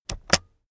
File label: keyboard external space press slow heavy